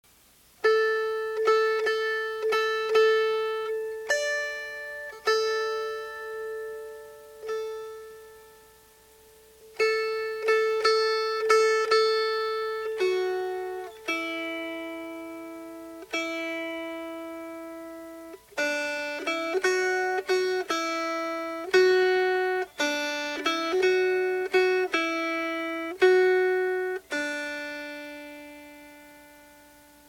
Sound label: Melody